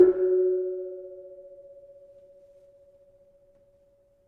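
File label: Kenong 4 F sharp, gravity harps tuned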